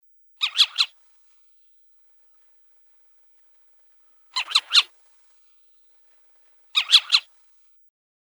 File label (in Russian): eshhe takie kriki domovogo sycha